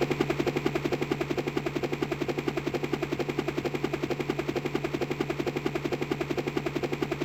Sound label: Heli Loop, drum loop; Yamaha DTXpress